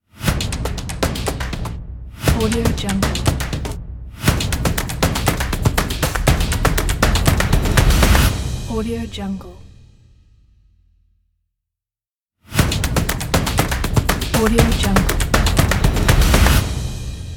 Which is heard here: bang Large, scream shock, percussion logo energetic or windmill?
percussion logo energetic